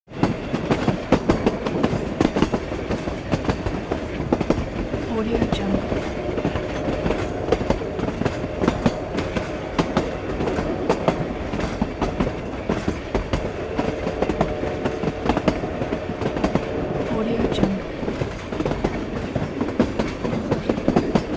narrow gauge steam train rides along

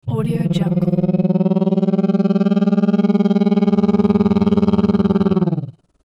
fluttering wail; sound ideas

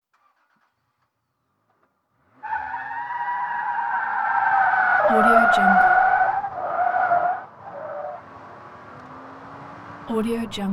auto accelerate quickly squeal interior

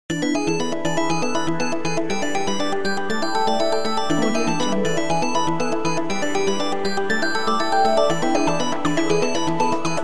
retro gaming stinger

